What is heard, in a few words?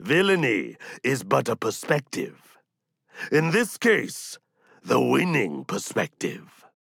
victory; darklord